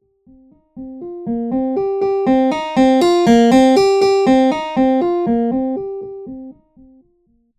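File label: Filter, Harpsichord; the filter to open up at higher velocities and close at lower velocities; velocity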